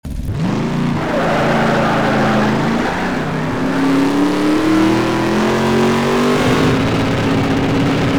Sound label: first, vehicles